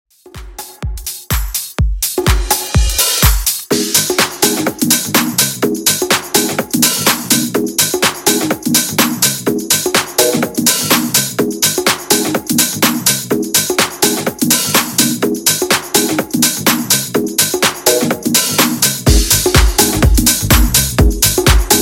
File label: Tropical House 125 Bpm